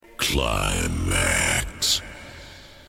qlimax sms

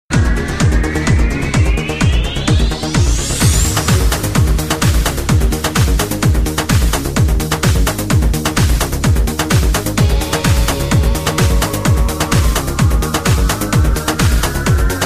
dance disco

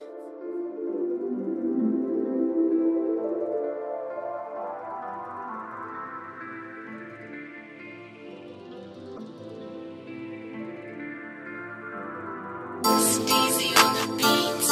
Beat

